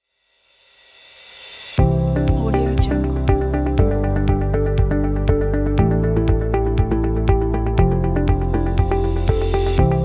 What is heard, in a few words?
uplifting ambient